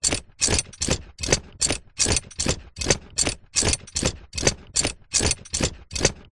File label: horse riding saddle movements just saddle running leather jingling of met; animals